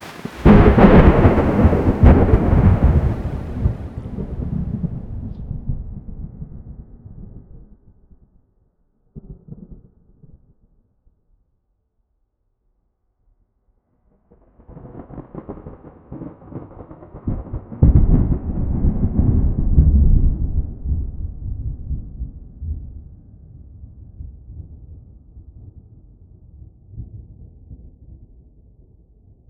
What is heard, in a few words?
extreme thunder explosive, nature